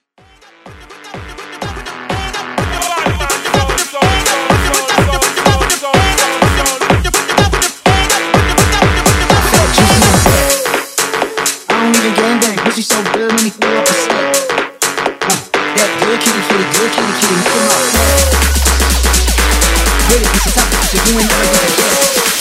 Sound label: Tech Phonk Mash Up